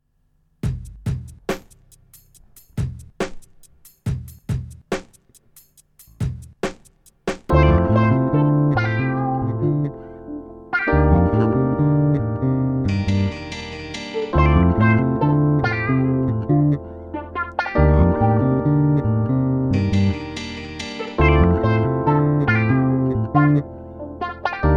16th p funky g unit

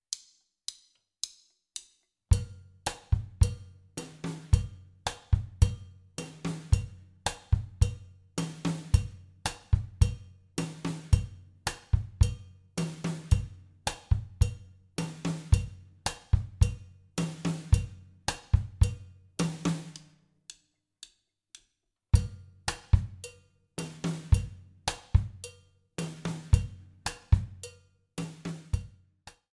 chacha

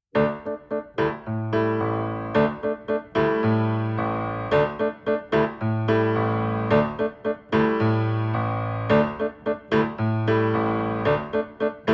tango playalong

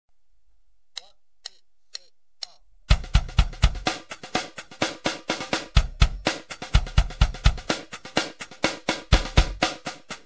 junglesteps

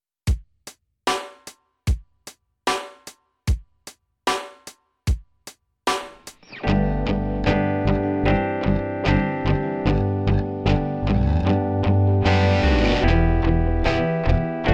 80s rockfeel

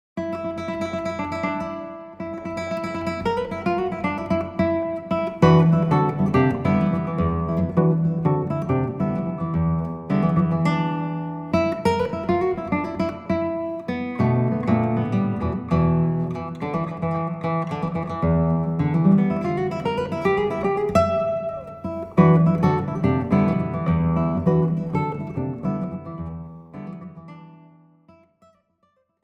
candombe

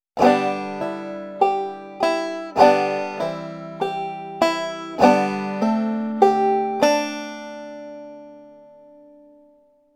chord bouncing

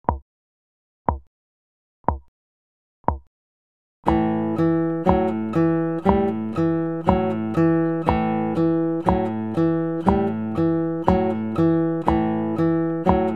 picking

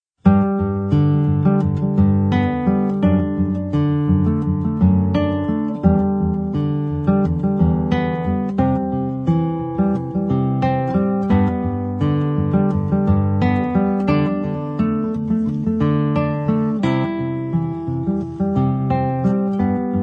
water drum

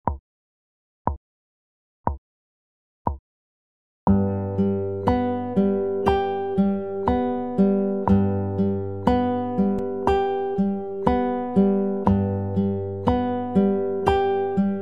picking

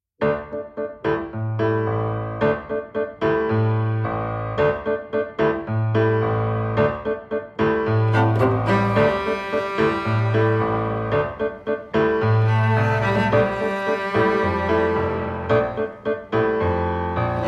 tango duo version

